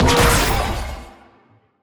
Attack Dash Heavy; bloom minigame

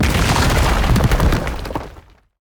Enemy Attack Charge End Rock; bloom minigame

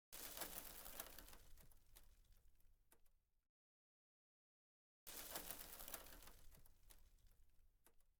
Ceiling Dust Debris

